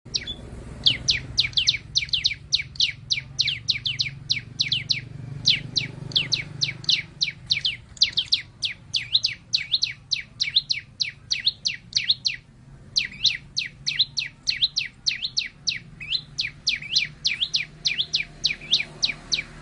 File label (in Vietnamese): Tieng chim Sau Xanh hot